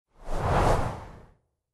Smash Woosh; The Fancy Pants Adventure World 3; Beard

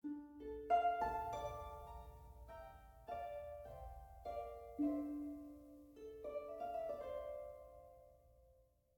harp; gamerip

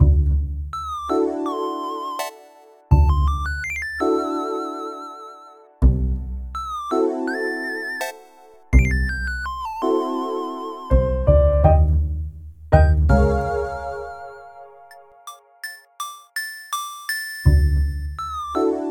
mood silly chill

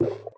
Collision small

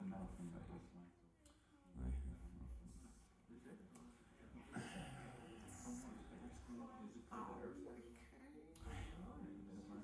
Office Crowd

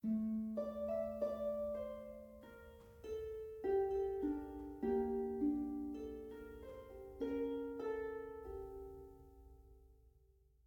harp

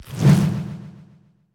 Swoosh; Eyeboss; Super Mombo Quest

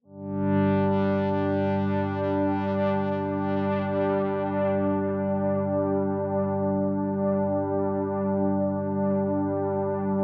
City Ruins Stem; Epitasis

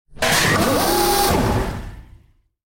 SCI FI, DOOR; gamerip; mechs mercs black talons

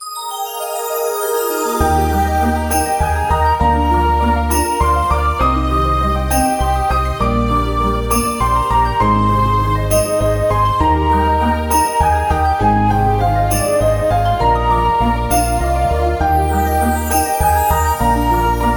Winter Snow Loop; winter memories windows gamerip 2024

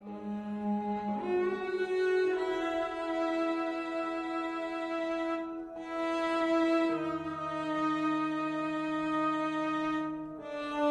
Wandering (Loop); The Walking Dead: The Telltale Series

